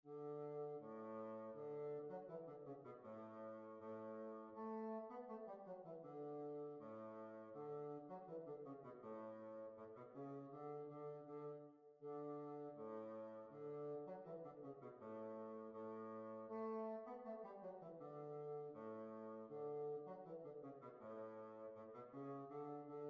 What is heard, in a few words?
Jig